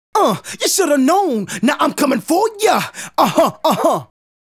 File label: Male Solo, Hip-Hop and RnB Vocals